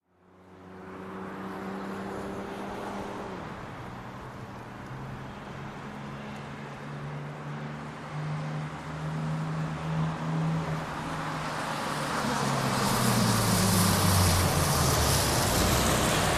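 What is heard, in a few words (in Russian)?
звуки приближающегося автобуса автобус останавливается звуки выхлопной трубы торможение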